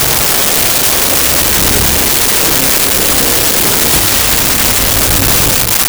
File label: pulse; Sound Effects Library (28Cds)